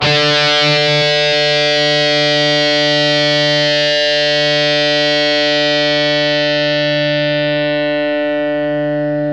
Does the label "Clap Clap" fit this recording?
No